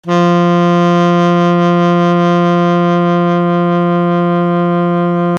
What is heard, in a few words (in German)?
Altsax F3